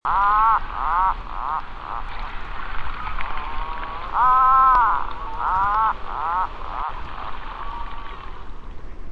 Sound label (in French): CRI; MOBICLIC162